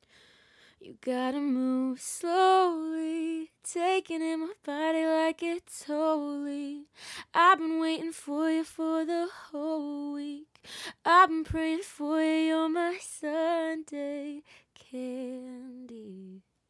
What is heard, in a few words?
singing; Sennheiser Profile; sennheiser profile usb microphone